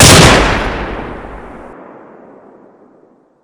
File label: Fire, gun